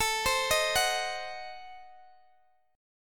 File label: A Diminished 7th A down Guitar Open D